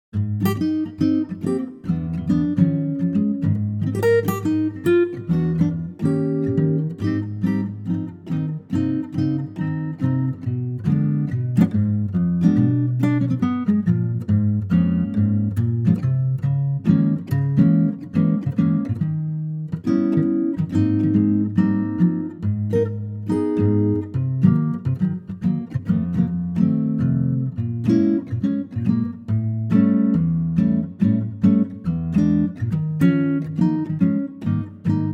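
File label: Ab acoustic guitar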